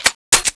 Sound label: sprifle clipin